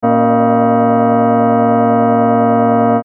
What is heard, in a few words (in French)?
cloche